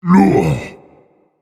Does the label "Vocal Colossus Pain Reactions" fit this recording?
Yes